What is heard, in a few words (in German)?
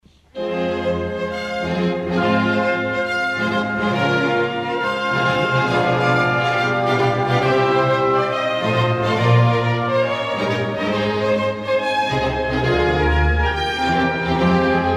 Coro Chor; Haendel Messias; Wahrlich Er litt unsre Qual und trug